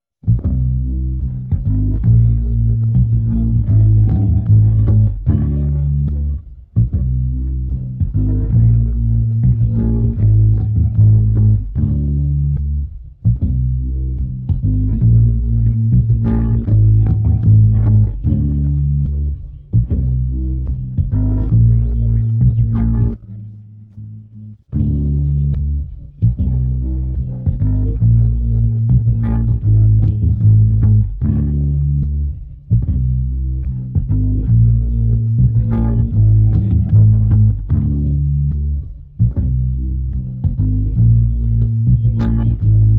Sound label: bass